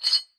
Chainmove

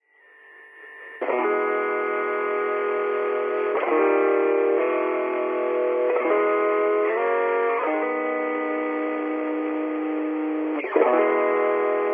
radio; assassin s creed valhalla

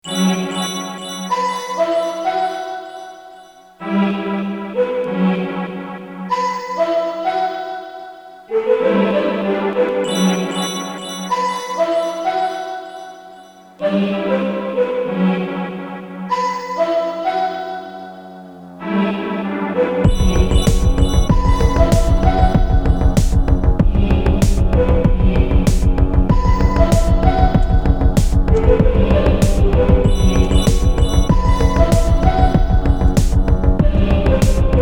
Groove; Forest Temple

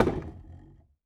Crate Impact

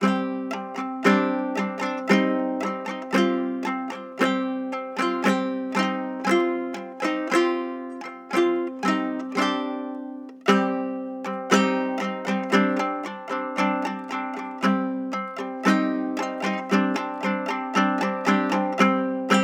Tempo 115.0 bard kitara; assassin s creed valhalla

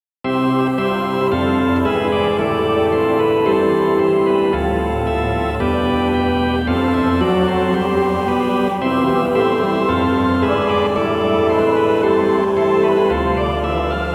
Dwarf Village; Dwarf Village (Purified)

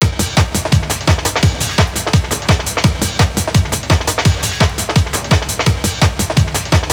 Result Aaa Jingle

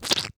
Splat

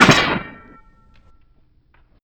Metal Pole Grab

